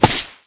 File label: himawari shot; VirtuaTennis